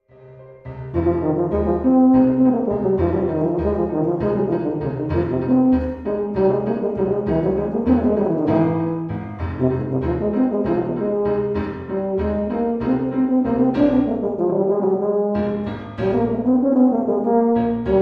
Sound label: Tuba